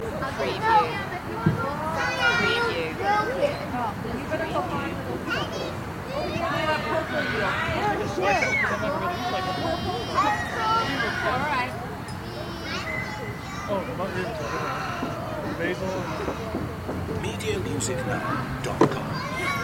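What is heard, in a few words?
Kids Family Playground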